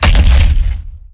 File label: Impact; Evolve; Goliath